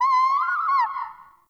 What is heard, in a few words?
Forest Open Glade Animal Far, Day; Ambience